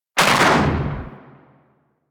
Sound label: Briefcase Launcher Shot A, Weapons; Warframe; Corpus